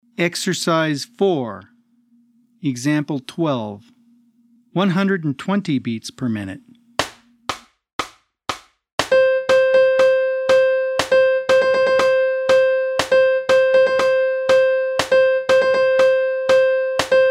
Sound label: 120 BPM